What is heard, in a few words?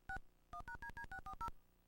dtmf vhs sound